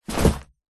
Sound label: foley garment bag fabric drop fall to carpet floor; zapsplat